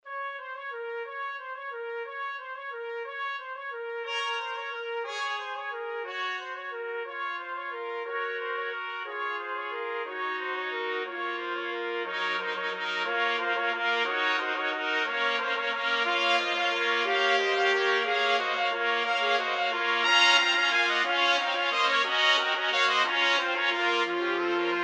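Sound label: 4 trumpets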